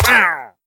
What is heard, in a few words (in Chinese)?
流浪商人死亡声